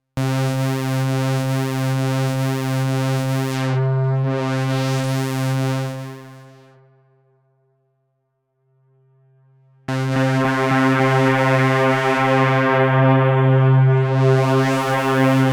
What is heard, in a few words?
Strymon Magneto Rec Level Clean Overdrive